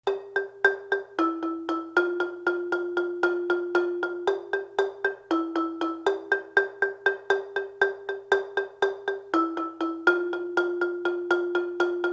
low rolling, marimba

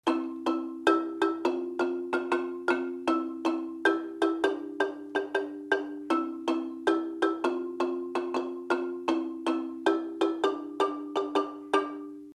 low chords, marimba

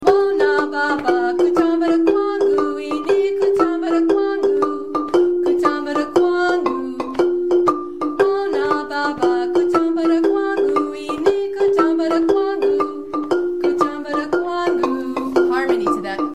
vocals line; kare mugomba

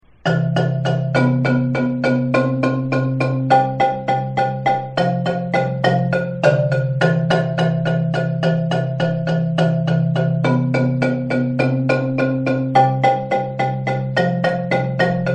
chorus, marimba

